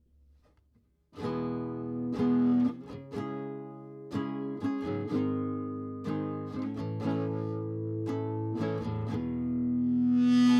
live Guitar baseline microphone Recorder sound Sample